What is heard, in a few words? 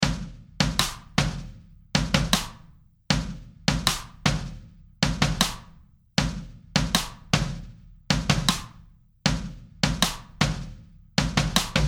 4 bar drum loop 78 bpm; sound bites resource kit